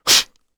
attack voice; UFC Tapout

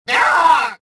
pain, rodian

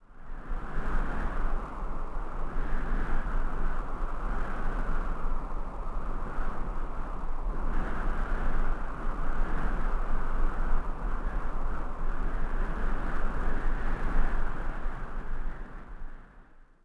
wind gust; Star Wars Obi Wan; XBOX

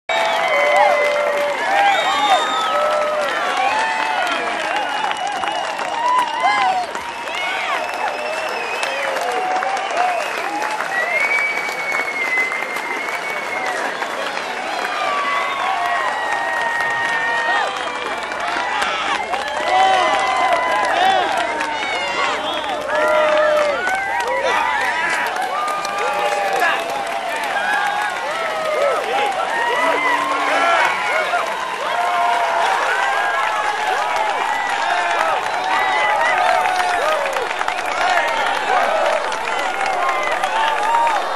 Crowd Med; Colin McRae Rally 05; XBOX

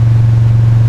GTO rpm, Engine; Cars

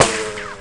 Ricochet, Impacts

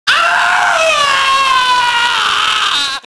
junkie death; enemy; Max Payne

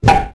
baseball default hit; projectiles; melee hit; Max Payne

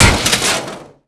chair

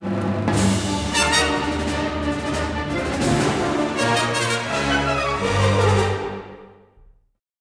battle, music flourish

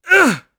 attack voice; UFC Tapout; XBOX

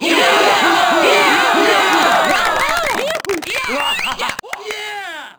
goal, Crowd German

